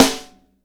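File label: snare drum, acoustic drum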